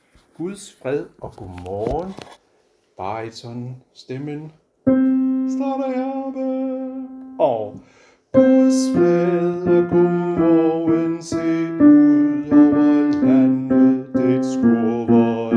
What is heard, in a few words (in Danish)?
baryton; Guds fred og godmorgen